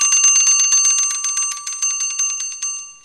household

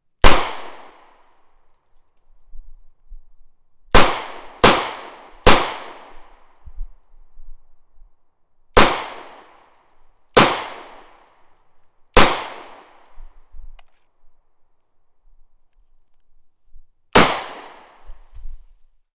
40 smith wesson

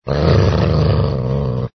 ANIMAL DOG

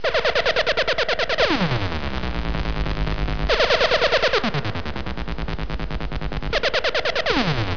scifi

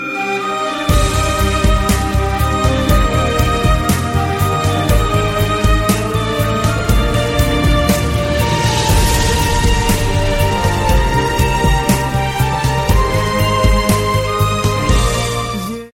heart touching

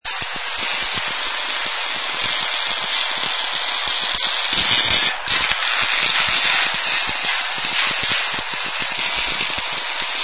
ARC WELD

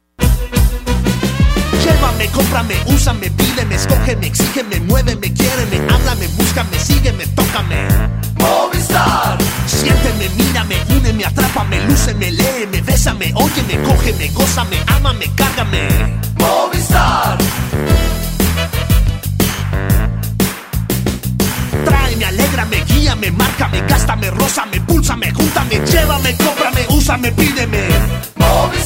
ringtones; movistar

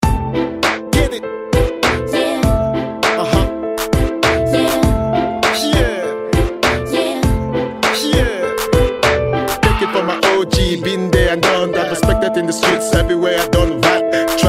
boxing ringtones piano

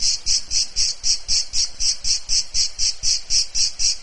cicades

